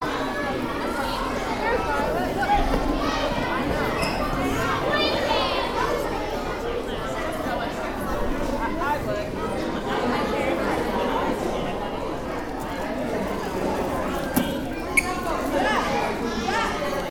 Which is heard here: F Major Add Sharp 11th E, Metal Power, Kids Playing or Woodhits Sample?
Kids Playing